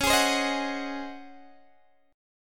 C sharp Major 7th Suspended 2nd Suspended 4th C sharp, Guitar DADGAD; strummed